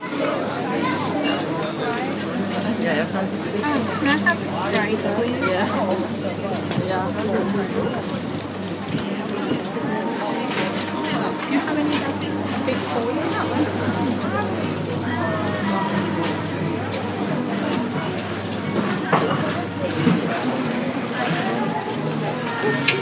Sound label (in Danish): julemarked